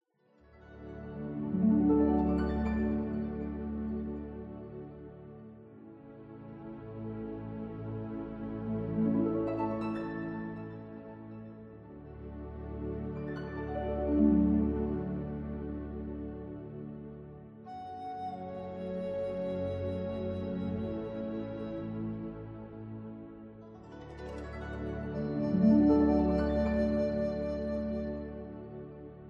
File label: Background Loop; BIOMUTANT